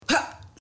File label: block